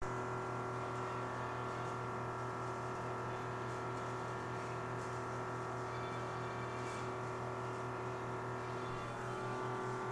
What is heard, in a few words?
2 note chord in the starbucks bathroom